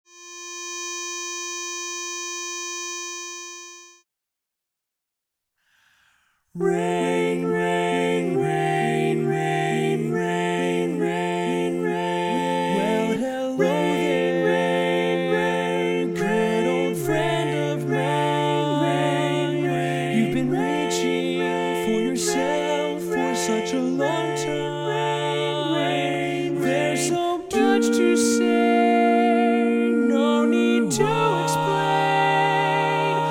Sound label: tenor left